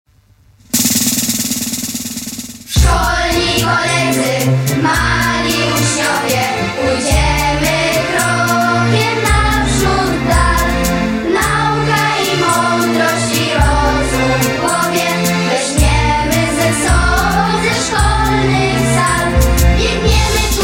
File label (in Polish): Hymn